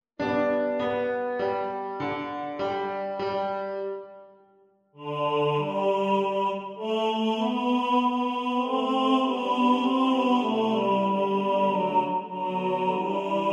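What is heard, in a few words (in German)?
Tenor1 im Vordergrund ohne Metronom, Männerchor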